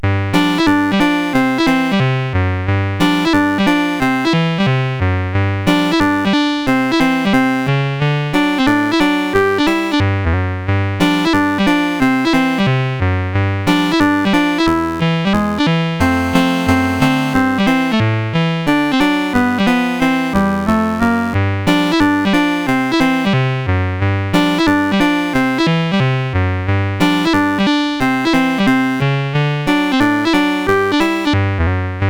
Ragtime Ramble CSG8580R5; Digger

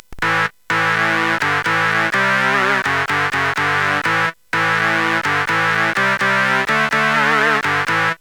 Guitar, CSG8580R5

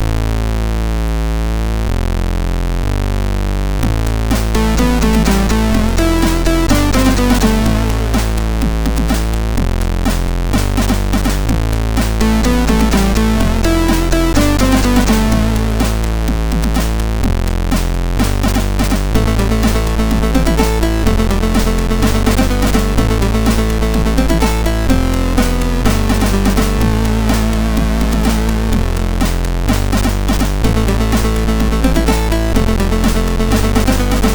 sid MOS 6581R4; Tepelrups part 3

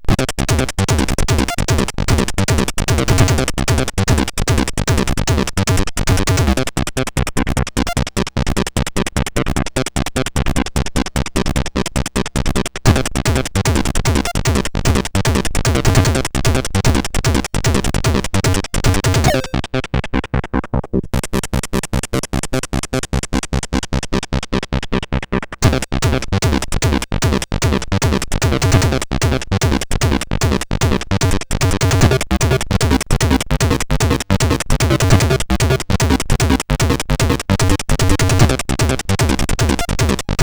Techno Rock; CSG8580R5

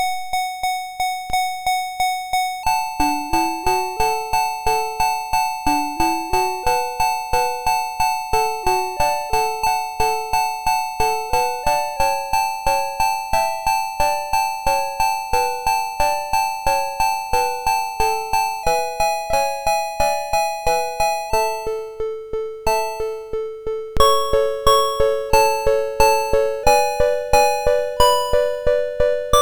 Rain Drops, MOS6581R3

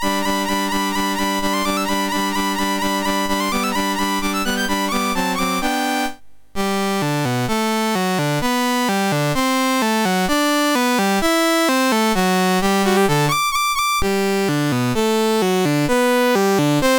Anvil Chorus, MOS6581R3; Anvil Chorus B; Dave Marquis

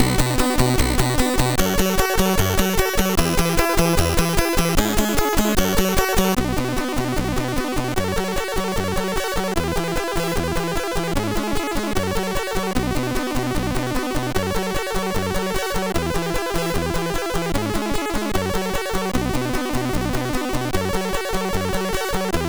Light, sid MOS6581R4

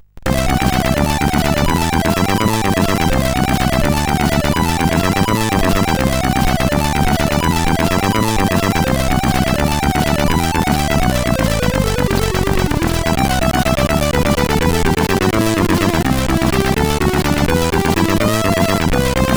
Dragon Sword, sid CSG8580R5

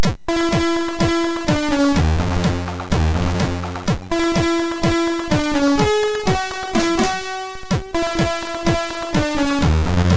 Music Sample, sid MOS6581R3

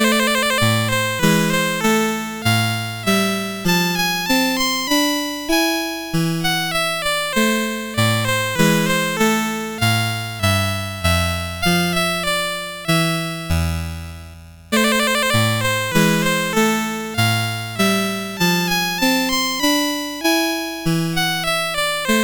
Minuet in A Minor, CSG8580R5

